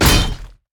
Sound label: basicattack; LoL; Nocturne